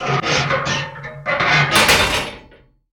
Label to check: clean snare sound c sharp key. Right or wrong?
Wrong